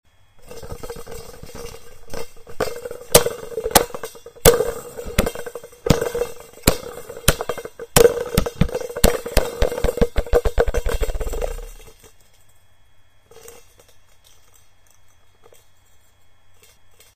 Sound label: bell basketball